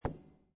Billiard Pool Snooker Billiard Ball Hits Side Of Table